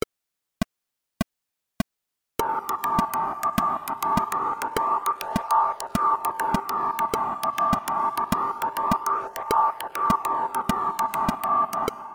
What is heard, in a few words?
Perc